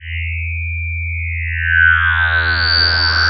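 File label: synth, Production Effects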